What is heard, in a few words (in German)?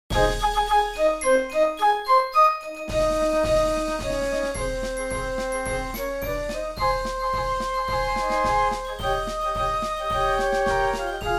Marsch; Marsch des Perchorsky Regiments